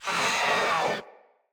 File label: Kavat Vocal Death; Warframes; Khora